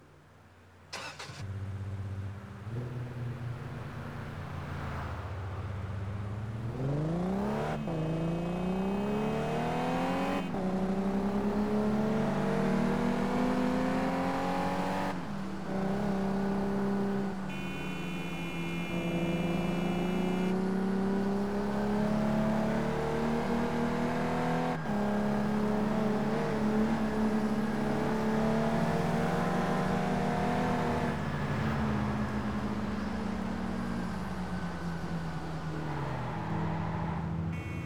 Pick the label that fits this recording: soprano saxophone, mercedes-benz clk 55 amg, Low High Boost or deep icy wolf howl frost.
mercedes-benz clk 55 amg